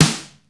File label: Fairlight CMI III Snare, Drum Machines